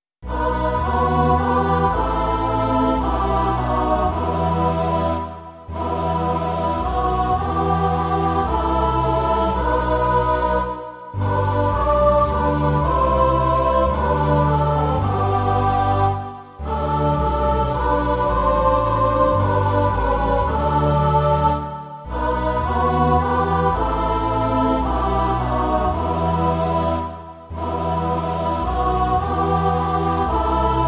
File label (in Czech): dětský sbor a basso continuo; Blahoslavený Bože náš; Imaginativní verze